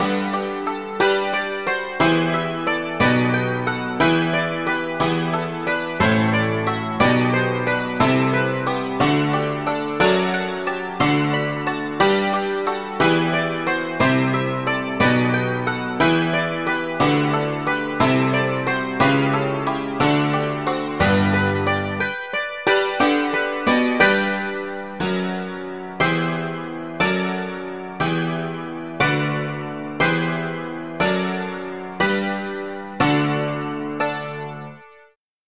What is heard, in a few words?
dulcimer